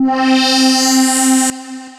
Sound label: Pencil Pop Taps; Synth Keys